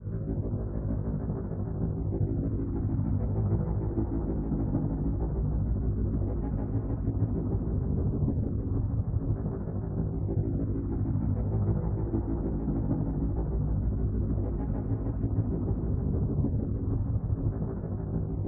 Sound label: Ball Movement Loop Trill